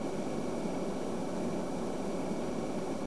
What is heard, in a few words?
Cockpit Systems; DCS